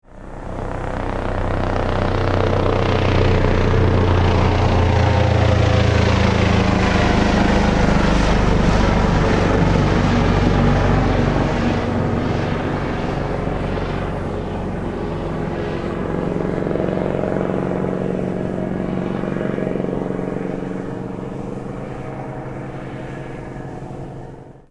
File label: helicopter fly over; salamisound